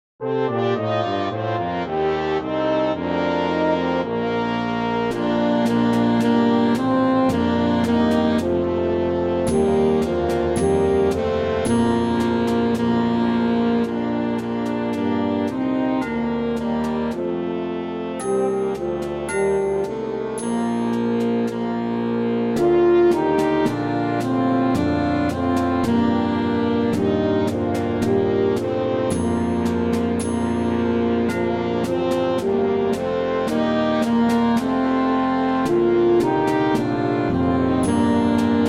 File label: Saxophone track with Melody; Good King Wenceslas